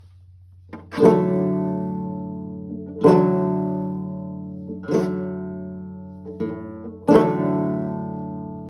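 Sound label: banjo sound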